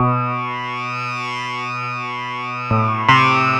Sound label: 2 Step, 133; USB Soundscan vol.51; House Side Of 2 Step; AKAI